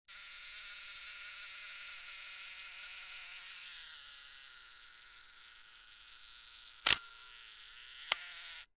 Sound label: CAMERA VINTAGE